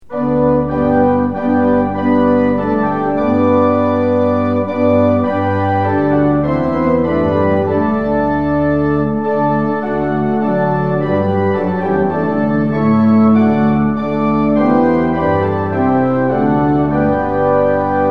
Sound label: tune; hymns; lillenas